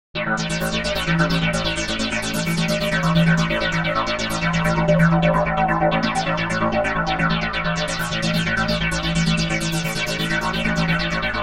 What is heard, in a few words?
Sytrus Flanger